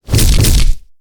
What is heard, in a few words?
body double punch